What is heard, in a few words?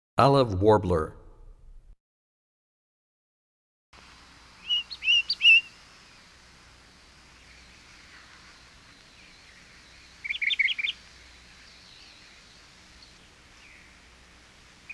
Olive Warbler, bird songs; 101BirdSongs